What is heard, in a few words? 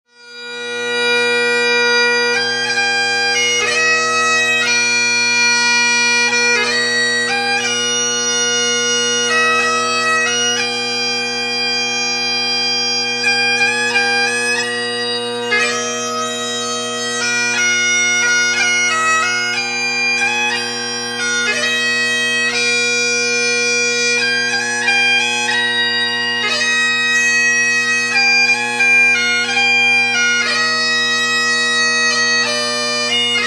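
bagpipes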